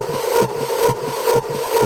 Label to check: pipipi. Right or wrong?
Wrong